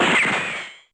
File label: Gun